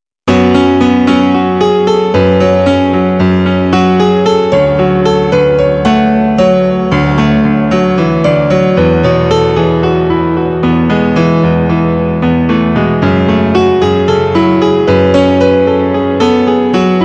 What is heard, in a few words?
normal piano